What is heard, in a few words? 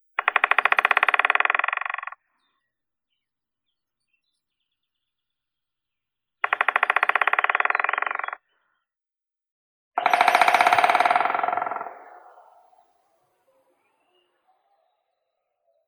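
white-backed woodpecker, Dendrocopos leucotos; dyatel belospinnii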